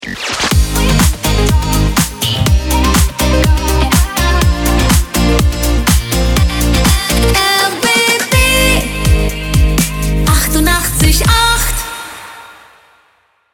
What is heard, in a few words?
Transition Ramp